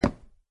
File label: wood 4; ayuncraft-1.5; dig